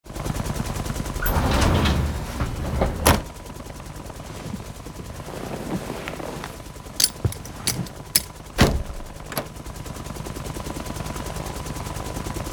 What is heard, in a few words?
Helicopter exterior engine blade entering the helicopter cabin